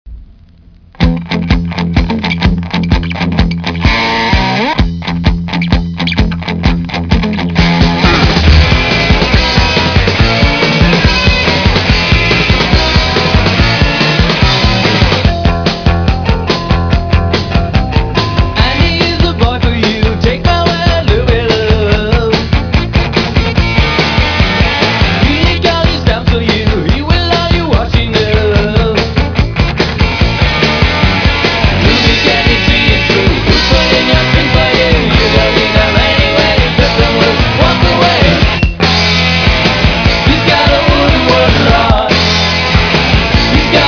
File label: pop tones